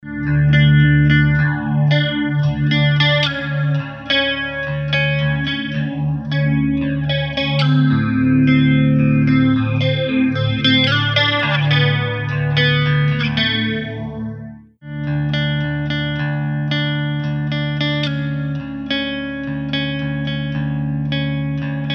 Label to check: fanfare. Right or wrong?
Wrong